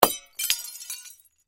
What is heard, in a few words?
Crash Glass Light Bulb Break Debris; Crash Glass Flood Light Bulb Break Debris; Blastwave